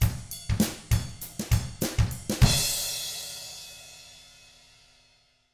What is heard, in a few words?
FUNKY, Drums